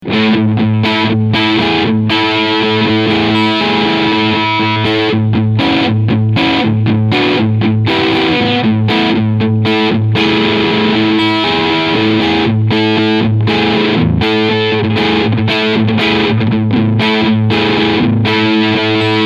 Asat Classic Bluesboy E Gitarre beide Pickups Crunch; G&L Tribute

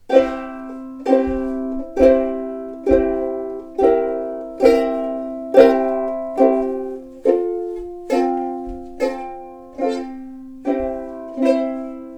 Niere vs. Kugel, Lauten Audio Series Black LA-120 V2

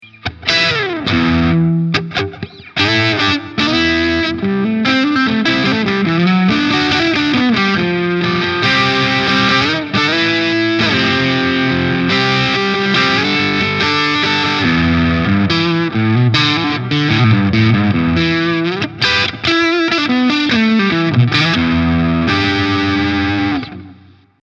PRS SE Custom 24 Roasted Maple Hals Split verzerrt

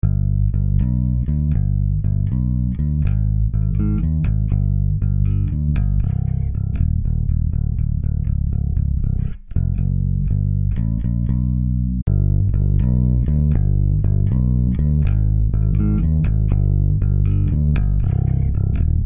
Opto Kompressor

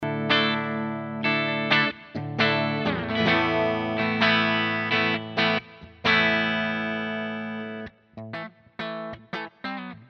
Les Paul 60s Steg Pickup Clean

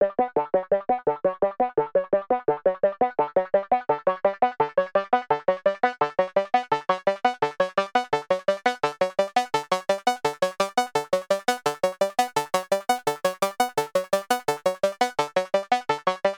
Behringer K2 Sequenz LP Sweep

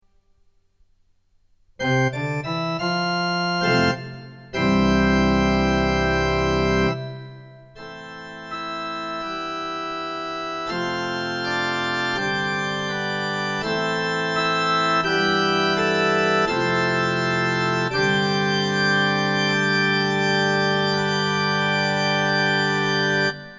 DP33 Orgel

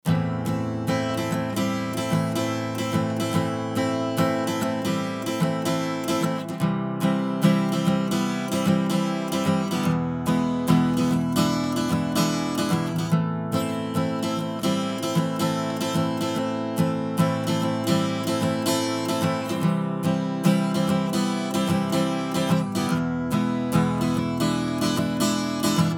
SSL UltraViolet Gitarre UV EQ

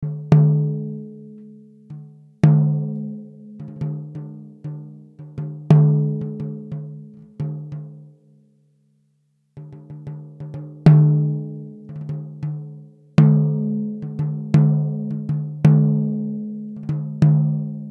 Tama LMP1455 tief

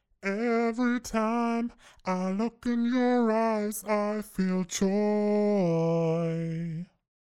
Vocals Formantenwerkzeug; Melodyne 5

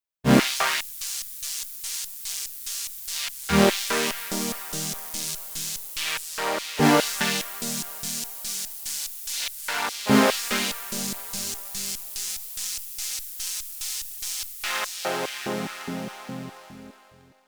OB-X8 Bandpass Sequenz

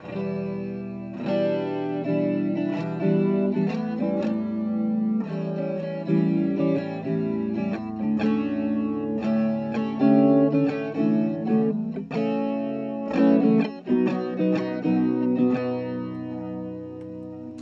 Chorus und Reverb

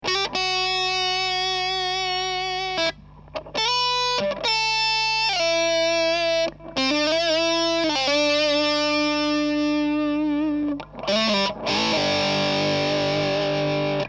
steg volle Zerre

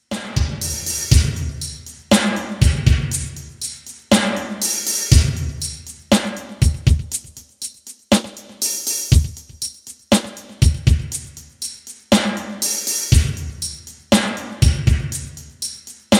Roland SRV-2000 Drums und Synths

